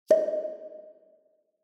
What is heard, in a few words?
Onoma-Pop (Reverb)